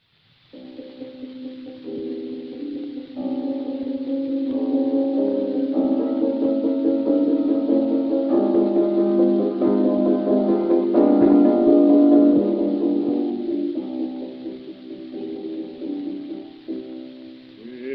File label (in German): Grammophon